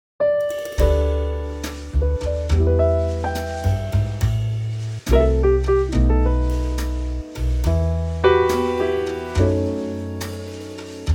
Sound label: Em Ballad trio; key - Em - vocal range - B to B; Moody Trio arrangement that keeps more to the original feel of this classic standard from "Porgy and Bess"